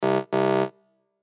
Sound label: warning